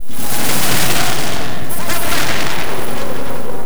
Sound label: FX Loop, Drums & Drones